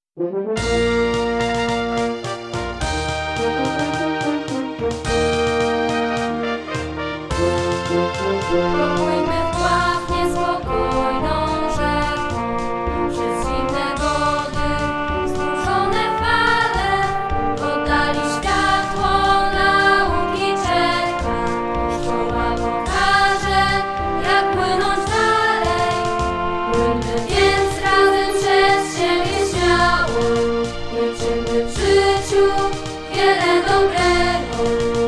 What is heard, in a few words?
Hymn; Gimnazjum NR 82